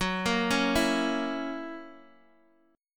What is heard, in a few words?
F sharp 7th, down, Guitar, Open G